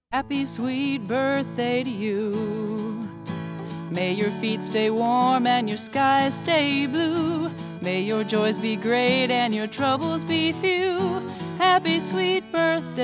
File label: HappyBirthday Short Song